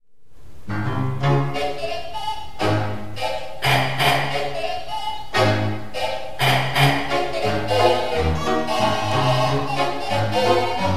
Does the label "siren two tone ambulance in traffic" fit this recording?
No